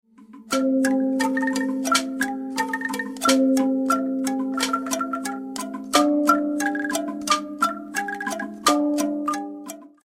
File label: idiophone